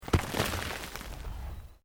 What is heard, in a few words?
Earth Loose Move Short